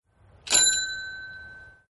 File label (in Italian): timbre; Daportare